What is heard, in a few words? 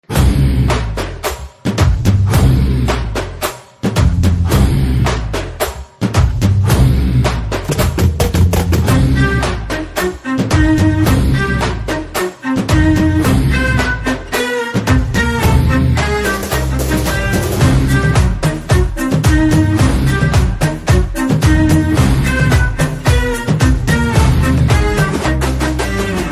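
background music Theme Telugu Ringtone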